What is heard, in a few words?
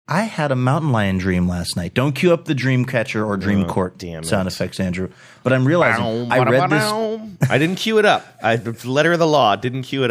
sings theme; dream court